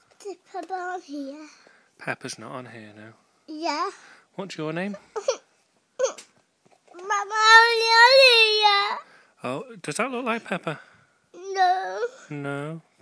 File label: boo hoo